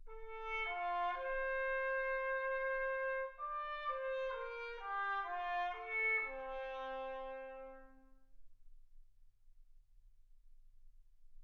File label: trumpet